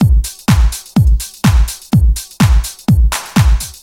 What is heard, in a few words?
Hard House Drum Loop